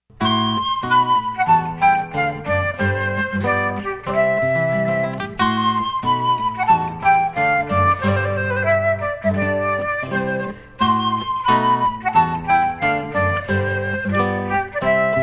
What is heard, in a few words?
adagio wedding march